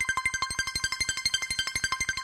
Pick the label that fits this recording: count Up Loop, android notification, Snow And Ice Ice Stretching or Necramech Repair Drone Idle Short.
count Up Loop